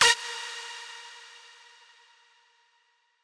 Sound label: lead